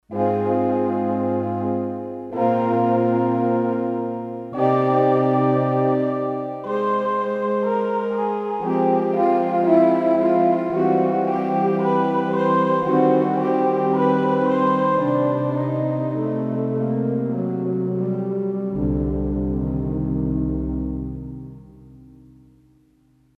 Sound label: Breath Choir, Roland D-10; Factory Patches